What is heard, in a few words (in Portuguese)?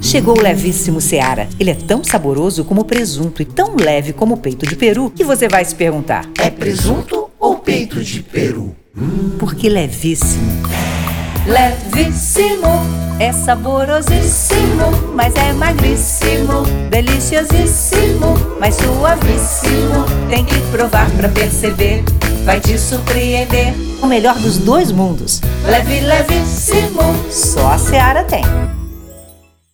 cantando Seara; Fatima Bernardes